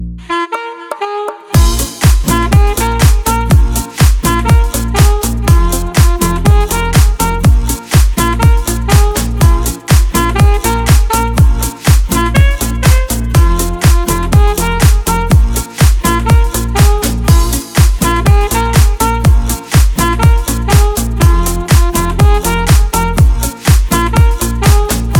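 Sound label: Tango Remix, ringtone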